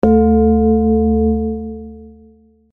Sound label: tibetan singing bowl